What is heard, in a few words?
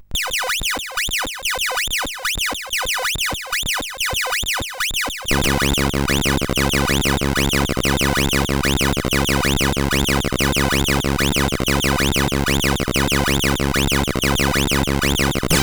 intro, sid CSG8580R5